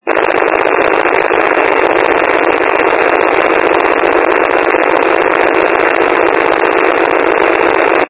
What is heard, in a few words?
wcalert, County Wide Paging; County Wide Paging Archive